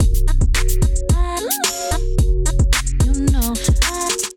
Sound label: Kit Groove 110 bpm; MIDI Clips; Ableton Factory Packs; Beat Tools